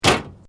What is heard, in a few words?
Metal Light Movement Minor; Metal (Sound Effects:Materials)